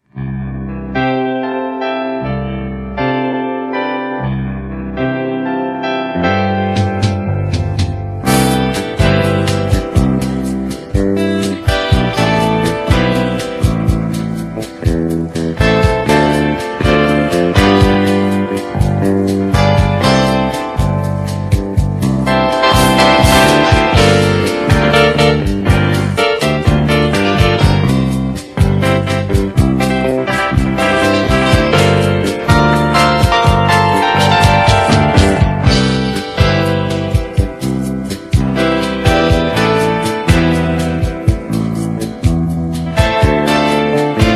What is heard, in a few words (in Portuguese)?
Caribe Instrumental